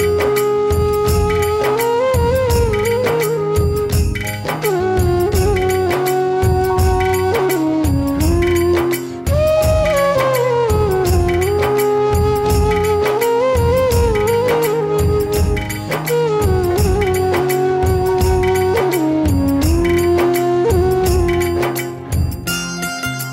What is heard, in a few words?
Music Ringtone